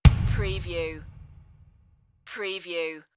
Impact Mid Tom

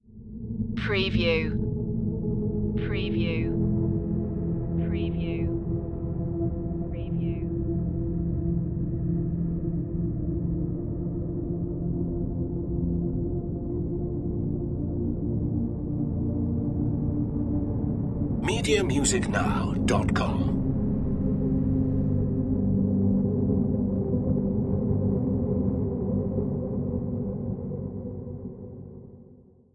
underscore twisting

